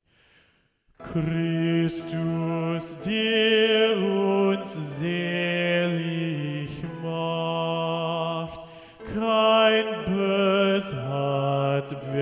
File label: Bass